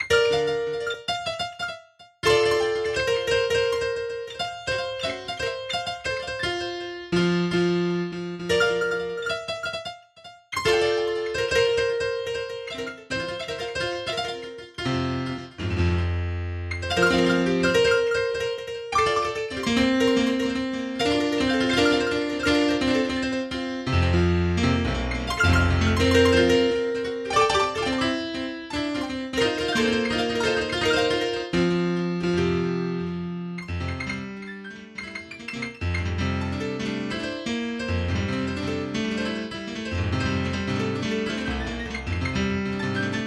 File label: Tip Toe Waltz